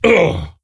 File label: Wound; Ley Guardian Eregos